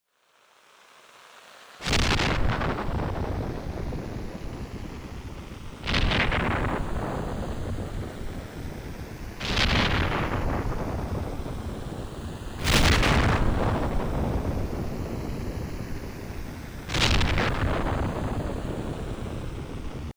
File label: rain and thunder effect; insideout